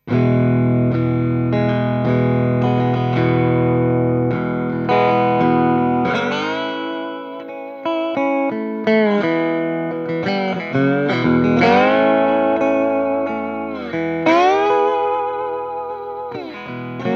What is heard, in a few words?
IT 11 Deluxe Clean crunch P90